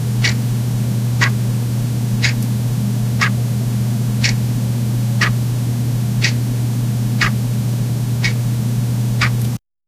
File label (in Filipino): tunog ng orasan na bumibi; time